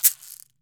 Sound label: CAXY, CAXIXI; AKAI S-Series CD-ROM Sound Library VOL-5